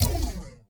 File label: Nexus Fail; lancer weapon fx